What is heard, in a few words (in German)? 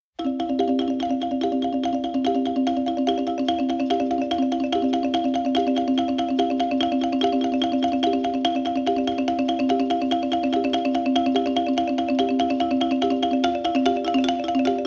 Xylofon